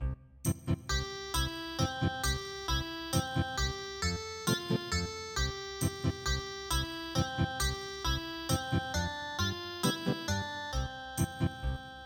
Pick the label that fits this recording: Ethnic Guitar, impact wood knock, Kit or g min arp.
Ethnic Guitar